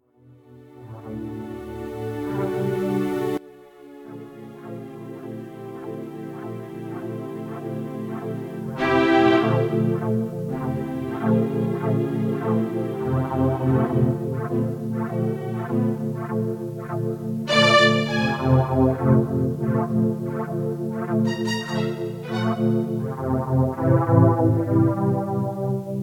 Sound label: keys; R1 studio sessions Quarantine